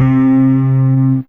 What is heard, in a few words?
Mandolin C3, Fairlight CMI IIe